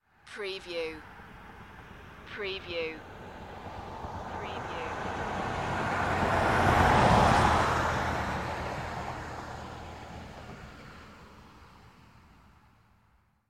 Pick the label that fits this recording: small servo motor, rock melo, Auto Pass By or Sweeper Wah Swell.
Auto Pass By